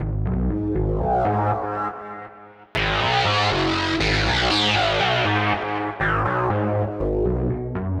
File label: emscripten bass; webaudio